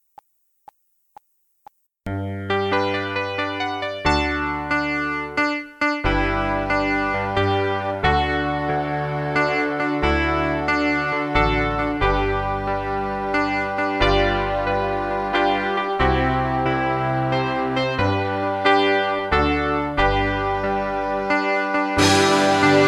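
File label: Party Song Happy Birthday No Voices G, backing track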